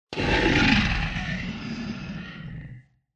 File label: Monster